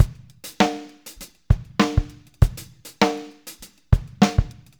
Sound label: mauled, Drum loops Boombap